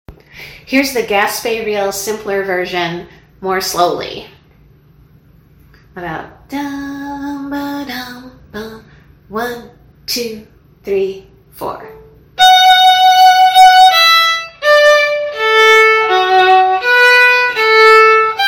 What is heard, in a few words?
gaspe reel simpler version slowly, fiddle